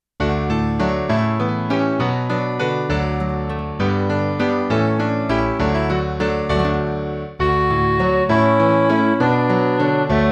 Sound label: midi